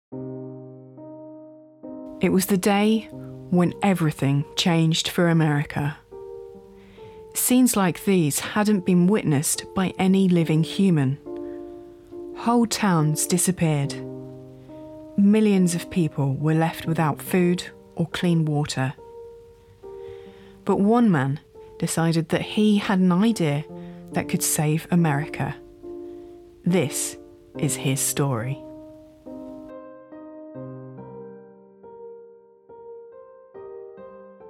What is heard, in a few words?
Documentary